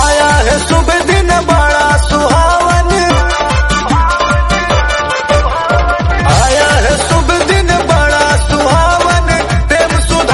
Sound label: Birthday Manao Bhojpuri Song